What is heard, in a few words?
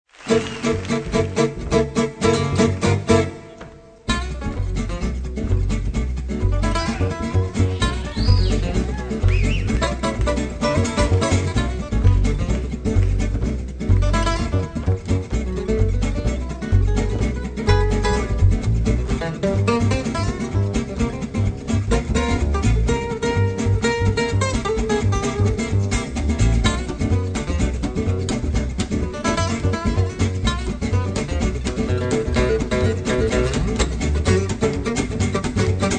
Mediterranean Blues